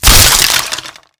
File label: crack, bang; lmctf